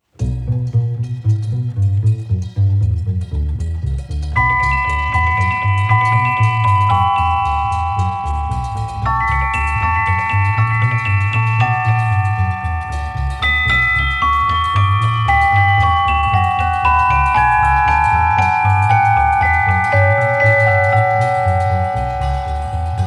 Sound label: movement in tempo presto